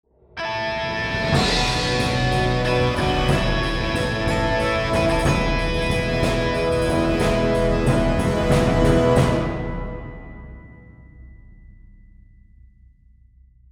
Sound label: for the win